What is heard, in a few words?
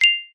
multimedia notification bell chime ping tone muted